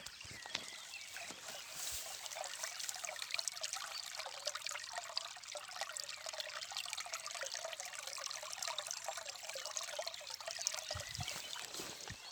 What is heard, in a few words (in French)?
Coulis de l'eau lointain délicat; Bruitages; Eaux